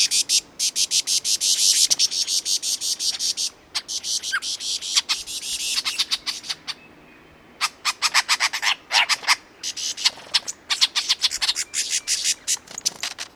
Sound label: lyrebird mimicry